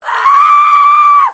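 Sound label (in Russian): женский голос, ужасный вопль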